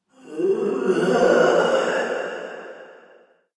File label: Ghostly Halloween Monster; Ghost Sound Effects